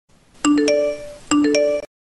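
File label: lion roar; animals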